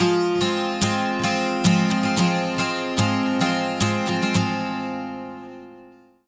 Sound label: 110 MIN 12 STR, Acoustic Guitar Loops